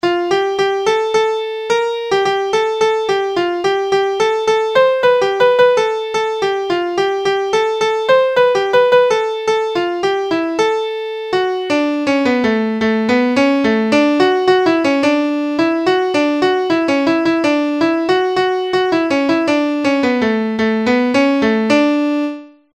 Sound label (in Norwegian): Udelt takt, Springar